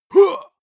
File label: male combat attack short